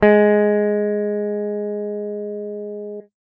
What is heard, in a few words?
guitar electronic, clean electric guitar